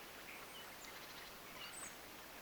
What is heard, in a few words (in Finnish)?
kottarainen ja ilm punakylkirastas